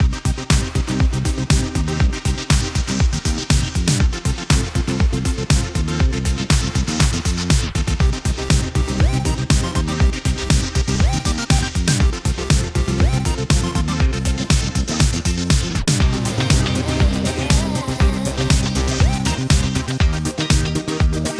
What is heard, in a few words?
Music Free Spins Loop; playboy Gold Desktop; Mahi Gaming